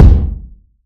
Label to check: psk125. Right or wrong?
Wrong